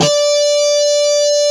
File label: A4 GTR FB; AKAI S-Series CD-ROM Sound Library VOL-8; SET#1 GUITAR